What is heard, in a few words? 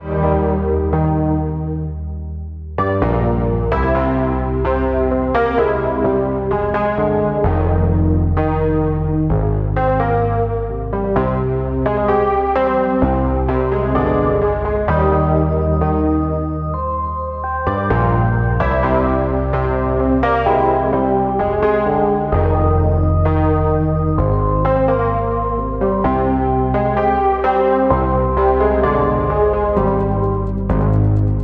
Start Menu Loop instrumental; demon compass